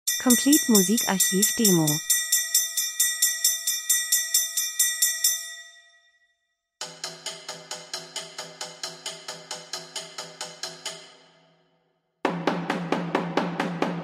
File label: drums; Percussion Opener Break Abfahrt Traditionell Reisefilm Spannung Akzent Dokumentation Lifestyle